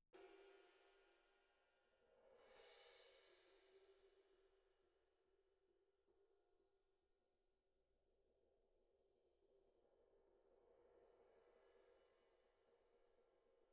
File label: Snare Bot